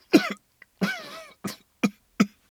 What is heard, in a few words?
vocal cough human coughing muffled male; human sounds; صدای سرفه مردانه